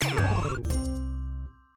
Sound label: game lose effect